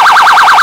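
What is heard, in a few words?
emergency vehicle phaser, sirens; whelen cencom sapphire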